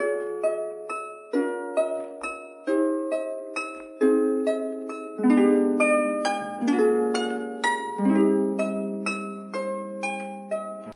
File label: guitar gentle plux, Loops, Phonky